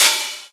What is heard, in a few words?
Open Hihats, Cymbals